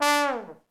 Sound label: THE TROMBONE